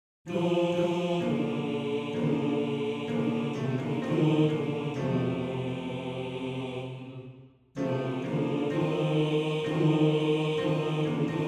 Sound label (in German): Bass; Alles Leben; Bass 1